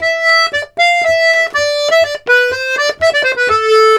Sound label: Accordions, 120 laride; USB Soundscan vol.40; AKAI